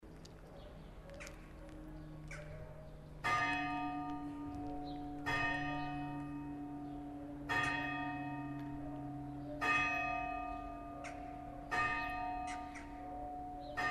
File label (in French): Nyon solos, sonneur de cloches